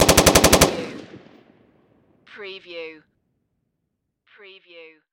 Machine Gun M16

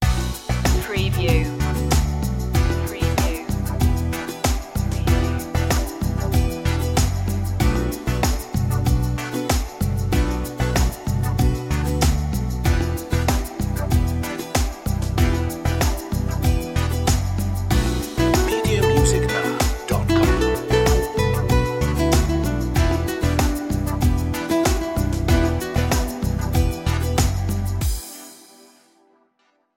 love rhythm

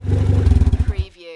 Car Motor Shut Off Engine Perspective

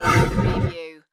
Whoosh; Sound Effects Bible

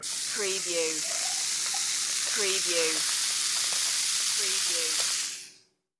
Soda Fizz

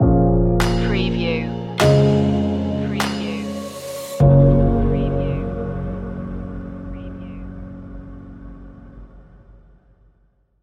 tropical bounce stinger

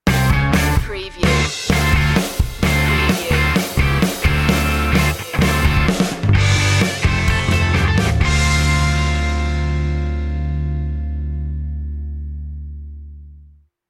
rock sitcom ident

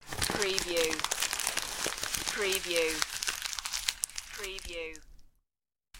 Paper Crumble

